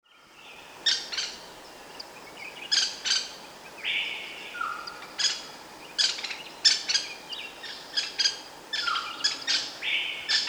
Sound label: pale headed woodpecker